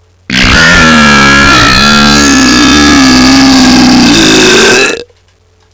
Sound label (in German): urschrei